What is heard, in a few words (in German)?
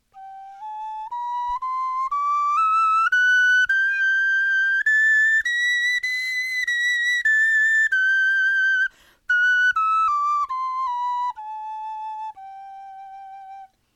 tonleiter